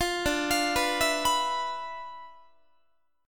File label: D Minor 6th Add 9th D, Guitar; Dm6add9 arpeggiated; 20,22,20,21,20,22